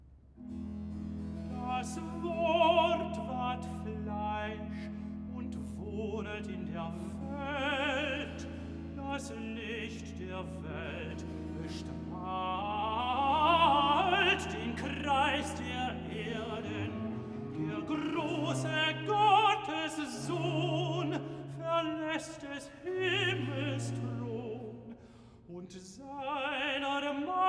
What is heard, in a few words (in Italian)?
Recitativo (Tenor); Cantata, BWV 40 'Dazu ist erschienen der Sohn Gottes'; Bach; New York; Xmas Day + 2nd Day of Xmas